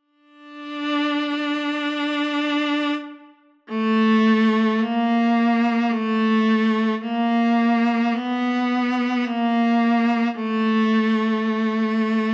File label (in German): fantasia fuer solo violine